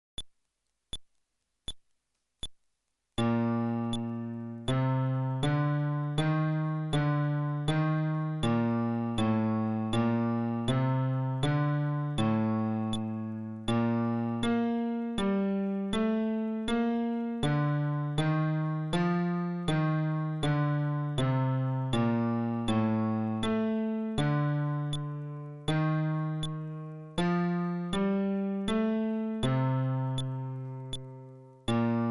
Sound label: Scale テンポ80, fretless bass